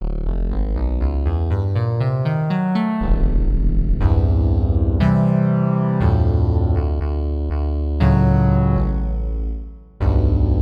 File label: Wah Slow, synth